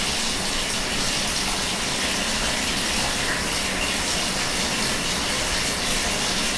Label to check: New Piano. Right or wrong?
Wrong